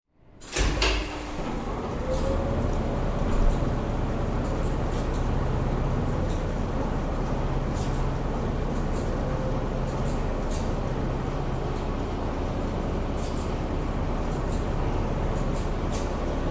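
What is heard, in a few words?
Elevator Engine Start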